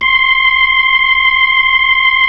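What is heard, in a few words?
B-3, Hammond B-3 organ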